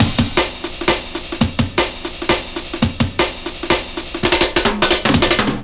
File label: Swift Beat, Drum & Bass, Beats & Breaks; Fluid Dynamics